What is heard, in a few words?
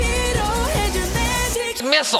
magic missile